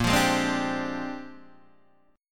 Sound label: A sharp 13th, Guitar DADGAD